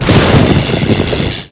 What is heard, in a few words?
thunderstorm